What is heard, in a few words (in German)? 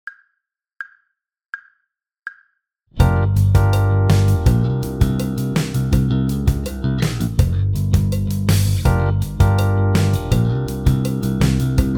Funk Groove Gm7, bass